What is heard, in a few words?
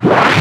FX Scratching; vengeance essential club sounds-3